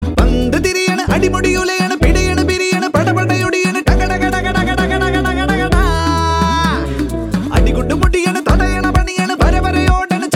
Cricket Song Ringtone

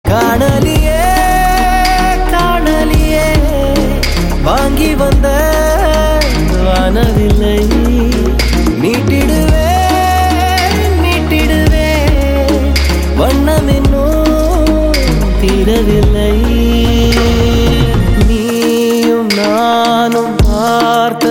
Love Ringtone

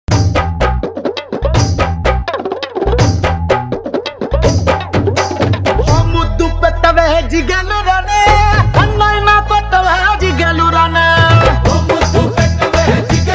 Mass Ringtone

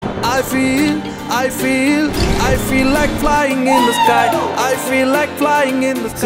Official Trailer Ringtone; Kannum Kannum Kollaiyadithaal

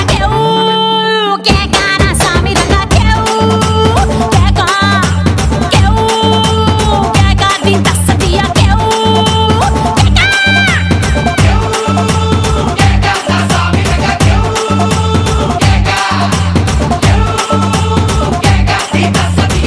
Female Bit

